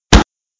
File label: block